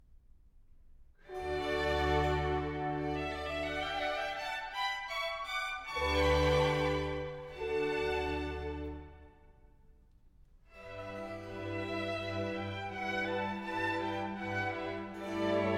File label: Trumpet Concerto Adagio